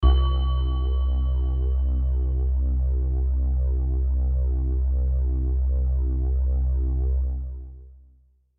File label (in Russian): zvuk pohozhij na zvon izdavaemij na sintezatore nota c